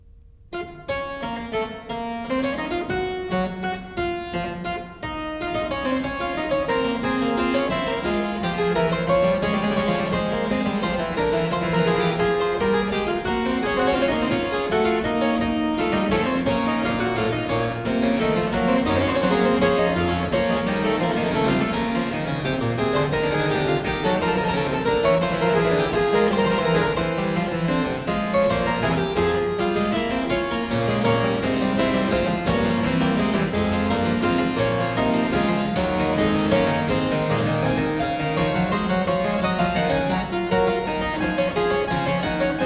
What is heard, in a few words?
Prelude & Fuge in B minor, Keyboard; No.24; BWV 893; Johann Sebastian Bach; The Well-Tempered Clavier; II Fuga